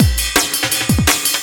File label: soul beat runna, breaks